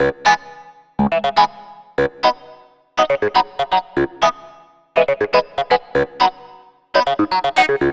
Clavinet; Dr. Fink's Funk Factory

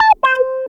Clavinet; Ambient Grooves

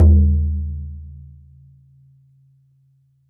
BODHRAN, Celtic Percussion; E-MU Producer Series Vol. 7 – Old World Instruments

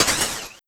Percy Hit, FX; Sonic Foundry (Sony Creative Software); Esoterik Beatz

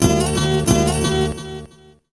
GTR; USB Soundscan vol.19

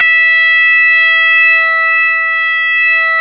GTR, Dirty; Club-50 - Foundations Roland; Stack

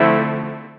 DR. LOOP; X-Static Goldmine 3; CHORDS

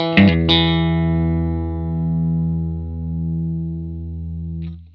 blues guitar riff E, Vintage Blues Guitar; USB Soundscan vol.22; AKAI

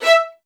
E5, LOUD VIOLINS; Q Up Arts - Denny Jaeger Master Violin Library

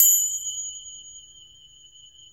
TRIANGLE F

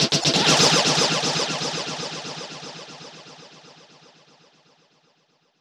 short fx; EZRollers

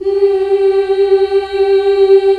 FEMALE HUM G3; AKAI S-Series CD-ROM Sound Library VOL-8

